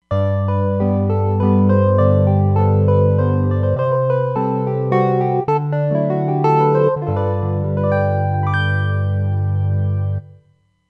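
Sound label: Pulse Electric Piano, Roland Fantom XR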